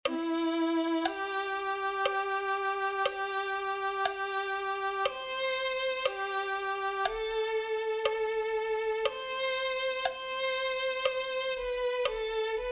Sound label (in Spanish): prefiero a cristo violin